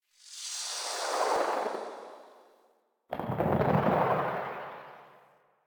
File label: Death Transition; Warframe; Caliber Chicks minigame; transitions